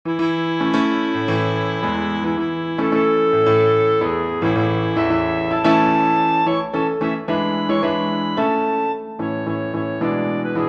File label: allegretto for clarinet piano; mendelssohn bartholdy felix; lieder ohne worte